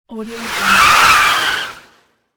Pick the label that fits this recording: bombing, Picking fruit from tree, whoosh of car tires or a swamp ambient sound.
whoosh of car tires